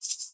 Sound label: rattle, ethnic and world percussion; Rattles